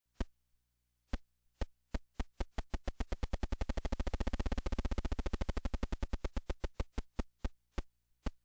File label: creaking door